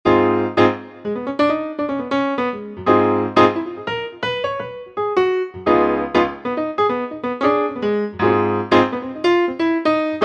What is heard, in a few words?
PianoPlayer, jazz klassik ringtones; ghia productions